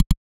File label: switch